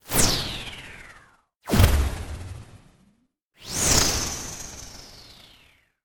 Small Whizzing Swooshes

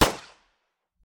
Pistol Gun Shot

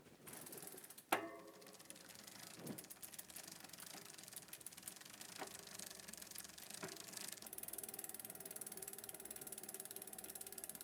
Bike

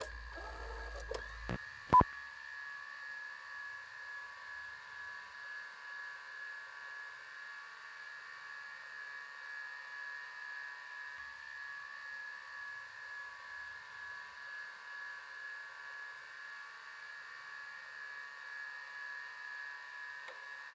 Old Vhs Sound

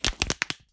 Bone snaping

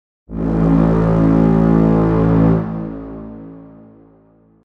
Deep bass

